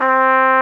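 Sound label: Big Band Fast, trumpet; Roland L-CDC-01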